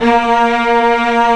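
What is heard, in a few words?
strings string, cello orchestra; Roland - String Master Series; Combos 1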